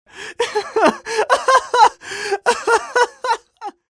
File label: taunt cry